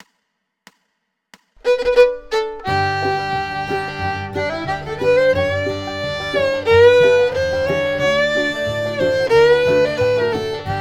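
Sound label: fiddle; Columbus Stockade Blues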